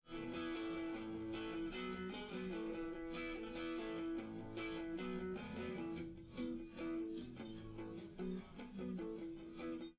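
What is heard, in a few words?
with drums, live rec; SiSEC11; underdetermined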